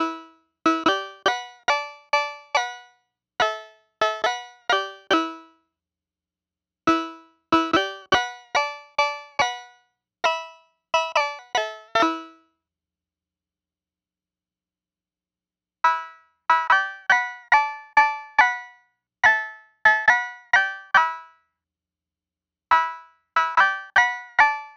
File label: Future Impact oriental plucked strings, bass